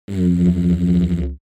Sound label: musical elements didgeridoo stutter